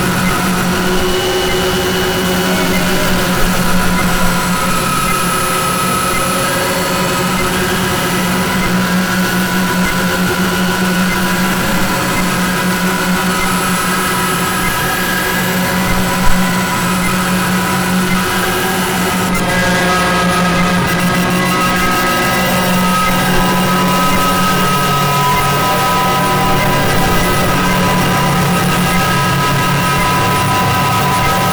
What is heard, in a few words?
Rimac Noise; Gabriel castillo; Rimac Noise 3